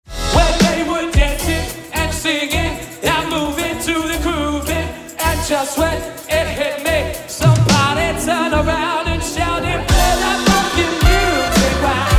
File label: Soul Band; Surrey